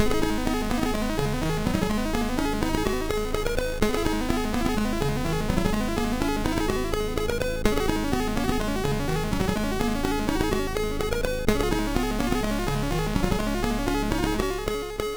sid, MOS6581; hvsc

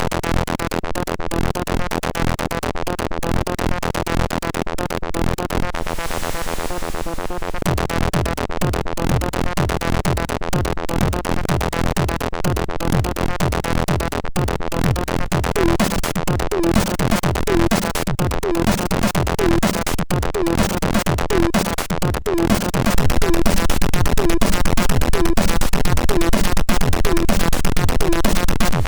Erotic Techno, MOS 6581; MOS6581R3

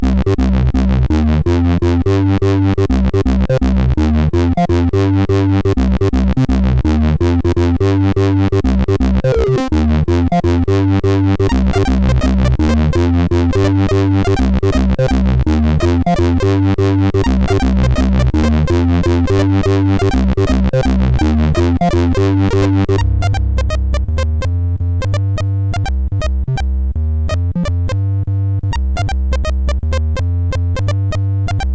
loadertunes, sid CSG8580R5; Vicious SID 2

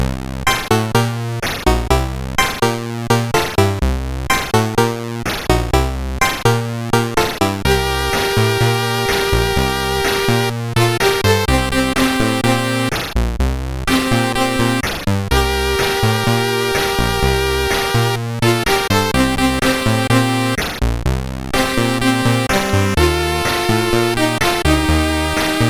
Synth Sample, CSG8580R5; Final Synth Sample II

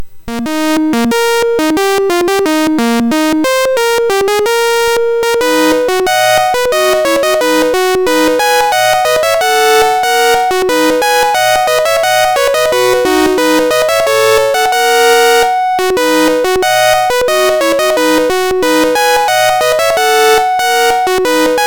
Dallas Quest, MOS6581R3

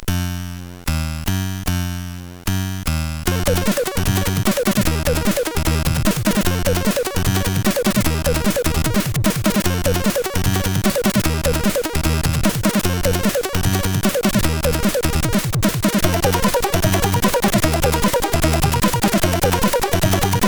Arabian Techno sid CSG8580

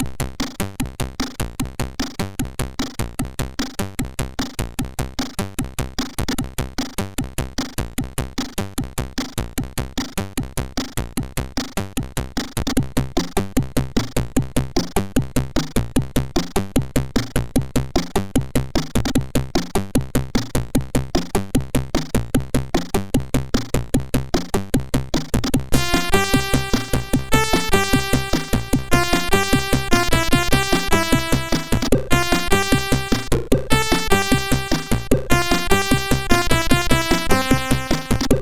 Loading Tune, sid, MOS6581R4; Jetpac64

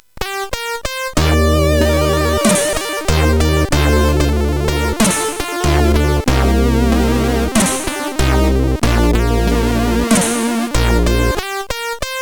Slow Blues, CSG8580; hvsc

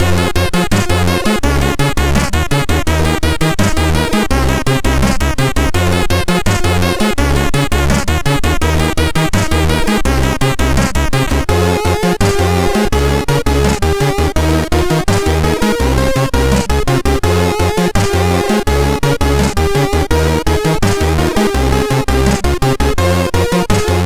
Groovy, sid, MOS6581R2

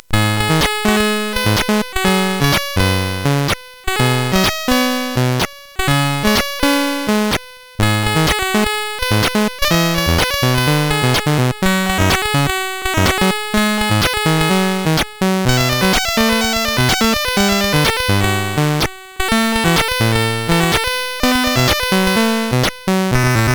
CSG8580R5, GAMES; Sonic Boom

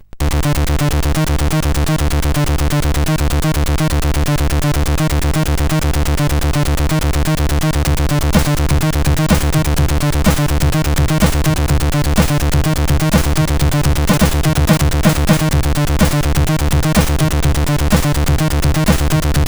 Digi Acid sid CSG8580R5

